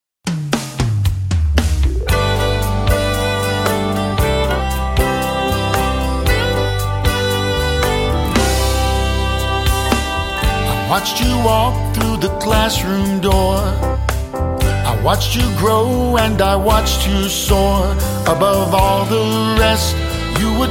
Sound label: Tropical Country